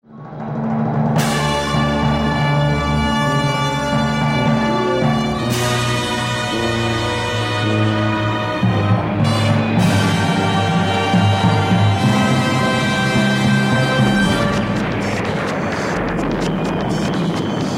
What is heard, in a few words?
Funk; Space1999